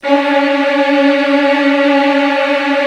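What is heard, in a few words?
violin trills; ILIO; Kirk Hunter Virtuoso Strings VOL-1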